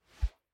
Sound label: Fighting Woosh; SoundsCrate